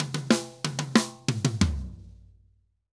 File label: Drum Break 120; Best Service; Festa Latina VOL-1; Drums & Percussion